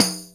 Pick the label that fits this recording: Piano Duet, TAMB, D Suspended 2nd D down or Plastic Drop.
TAMB